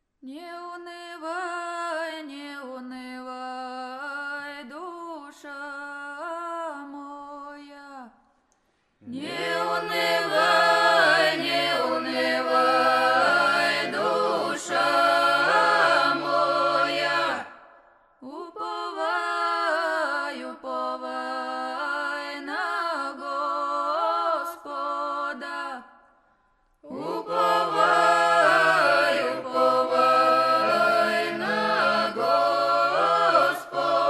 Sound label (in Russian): Ансамбль Не унывай, душа моя